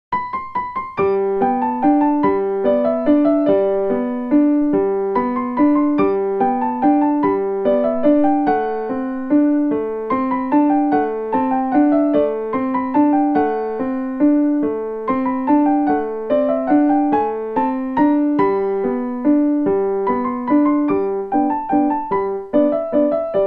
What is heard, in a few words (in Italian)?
Dolce sirena; I miei cartoons